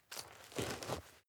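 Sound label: step dirt heavy boots scuff, footsteps; schritte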